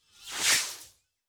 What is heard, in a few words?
energy cloud whooshing by very fast